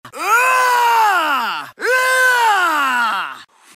yelling; frank west

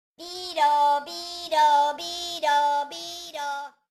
ambulance; minions

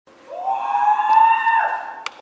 funny scream ahhhhh

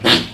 fart short and massive

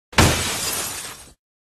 window glass breaking sound

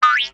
boink in g major

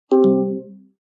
airpods disconnected

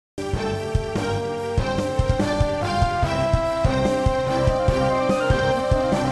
battle music

